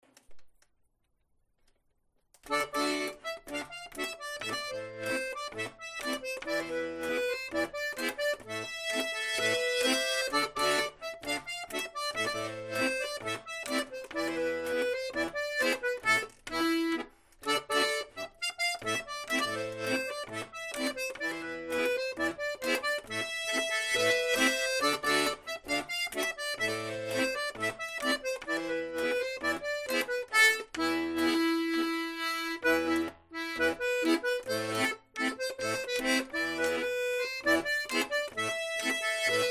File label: tango